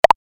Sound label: move